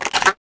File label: Reload Pump shotgun; weapons; Max Payne